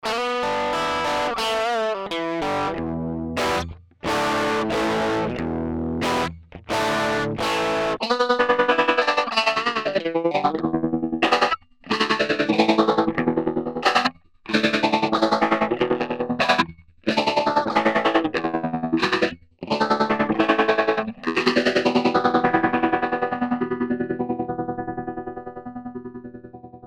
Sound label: shifting tremolo